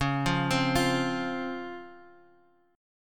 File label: Db Major 7th Db down; arpeggiated; JGuitar